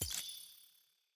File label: ui right button click